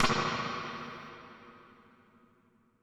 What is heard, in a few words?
FX RIM, Drums Acoustic; ALL RIM; USB Soundscan vol.10; AKAI; 90s sample CD